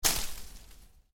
Foliage hit soft, Foliage Impact, Soft Foliage Hit; Miscellaneous Impact